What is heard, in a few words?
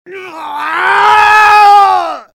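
spy pain critical death; tfc; svencoop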